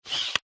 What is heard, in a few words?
technology camera dslr lense zoom manual turn canon eos 6d; zapsplat